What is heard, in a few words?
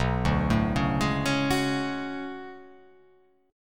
B Minor 13th, chord; Bm13 arpeggiated; JGuitar